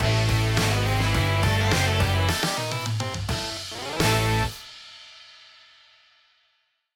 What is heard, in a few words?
Escape Music; the escapists 2